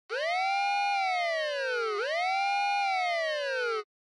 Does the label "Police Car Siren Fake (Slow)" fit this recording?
Yes